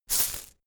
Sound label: insect shaker hit double hard